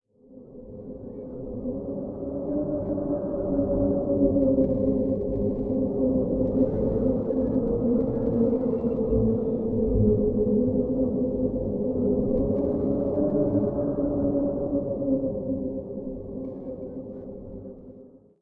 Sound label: temple ambient hallow; dota; conquest